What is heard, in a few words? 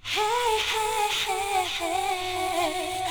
VOC